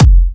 Hard Kicks; Vengeance Samples

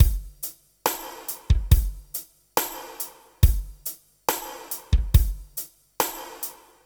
Slomo Beat 140 BPM, Loops, Slow Motion Beats; Vengeance Samples

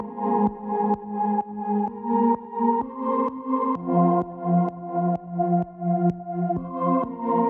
128BPM Melody; Vengeance Samples; One Stop Kit

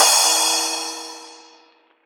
Crash, Cymbals; Vengeance Samples; VEH2

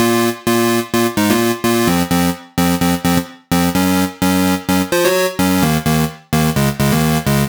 Synth Analog, 128 BPM; Vengeance Samples; Vocal-Hook Kits; Song Kit; Keep Believing

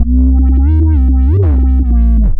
Roland B Line, Roland S100; musicradar-synth-samples

